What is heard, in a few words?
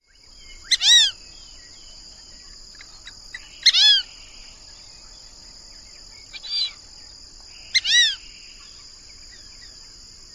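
Fulvous Whistling-Duck female calls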